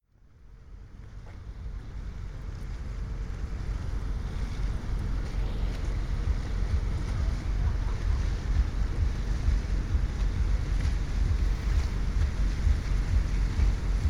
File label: Moscow river quay, Moscow; MOSKVA